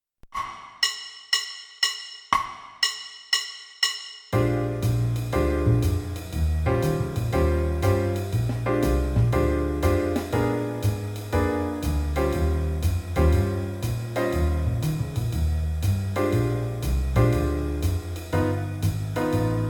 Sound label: Blues in Bb qn=120; 4 choruses